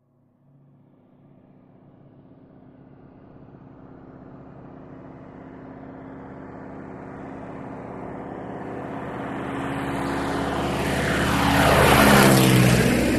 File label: Stearman Runway By, stearman